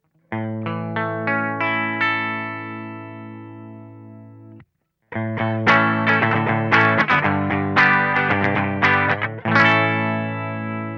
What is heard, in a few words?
Ham clean; FGI